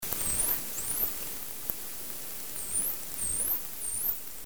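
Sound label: Tursiops truncatus whistles; Tt whistles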